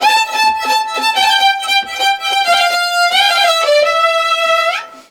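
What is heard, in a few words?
Balkanish Traditions strings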